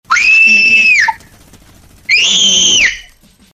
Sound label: screaming rage